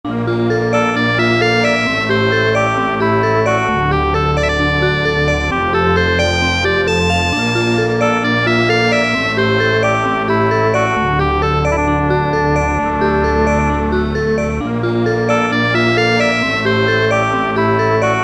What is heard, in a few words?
Lose Jingle; Chicken Wiggle; 3DS